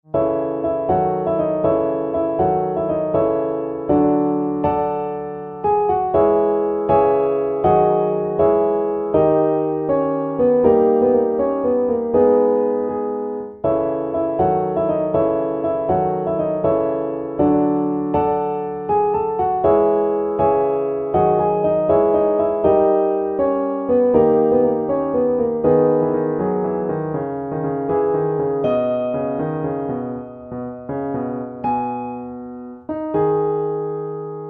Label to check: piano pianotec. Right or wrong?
Right